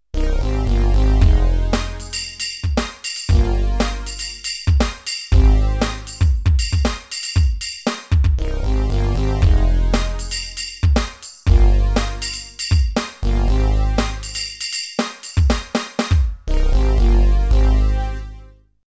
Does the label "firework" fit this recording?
No